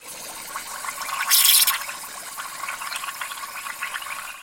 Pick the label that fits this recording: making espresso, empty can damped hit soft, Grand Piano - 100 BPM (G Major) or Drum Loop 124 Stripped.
making espresso